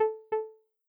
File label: speech continuity tone